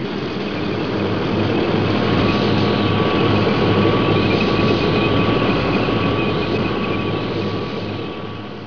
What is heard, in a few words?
unitsound medium; netpanzer